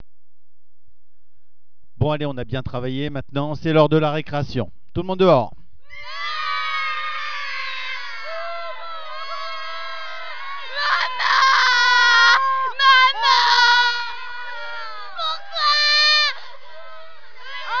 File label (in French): pleurs recreation